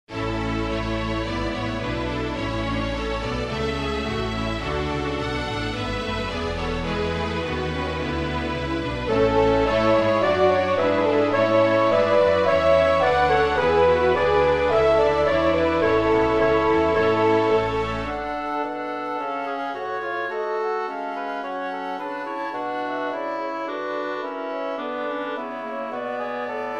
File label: Chorale